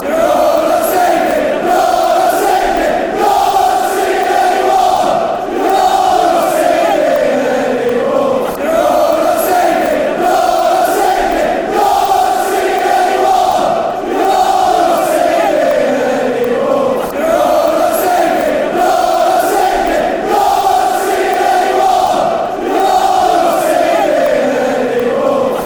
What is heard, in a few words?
your not singing anymore